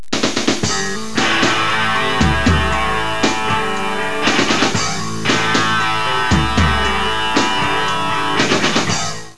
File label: flanged bass; Peavey bass head with the built-in flanger